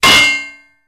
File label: Metal Hits Metal Pipe